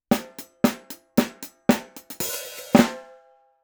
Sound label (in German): the t bone CC 57 Mikrofon Pearl Snare 55x14 plus Hihat 5cm Abstand